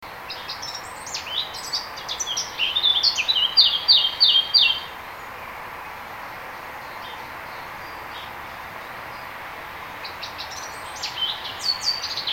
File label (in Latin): sylvia atricapilla; vogel; hecke; biologie